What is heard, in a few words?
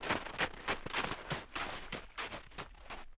snow run; anemoi